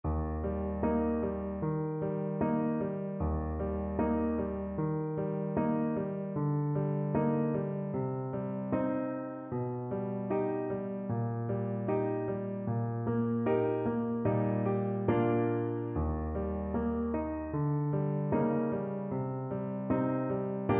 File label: a la claire fontaine, Clarinet